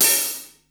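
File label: hi-hat rock half open; 300 Drum Machines; Yamaha Motif ES-Series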